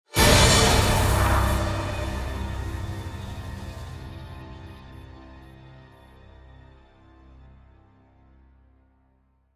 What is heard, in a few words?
Feverish Orch Strike; Gothic Storm Music